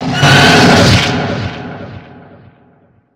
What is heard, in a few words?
jumpscare; the monster plane; huggy spider